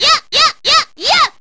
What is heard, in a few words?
thou kick